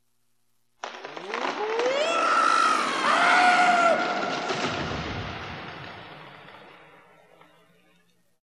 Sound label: scream drop; themepark; rides; splash mountain